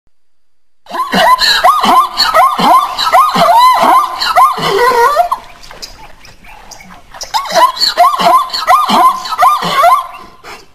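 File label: zebra voice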